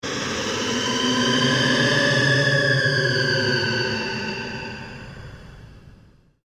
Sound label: PULSE LONG SWEEP; Sound effects created by manipulating other sounds; Sweepers; Sound Design